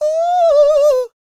SING, Vocal Planet R&B & Dance; ILIO

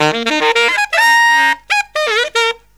SAX, Killer Brass Riffs

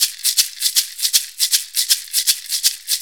Shuffle Perc, Perc Loops

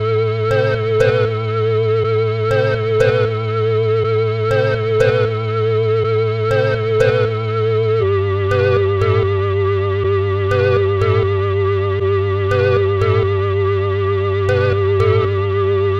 Electro Organ; Sonic Foundry (Sony Creative Software); Afterhours EDM; Retro Active

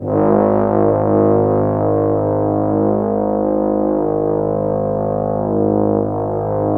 trombones G#2; Q Up Arts; Denny Jaeger Private Collection

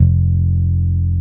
BAS; Best Service Gigapack 2

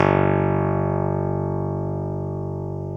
KEY X Hohner Clavinet; Club-50 - Foundations Roland; Clavinets

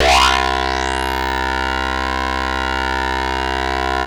Anasound Long mid C, Analog Single Notes

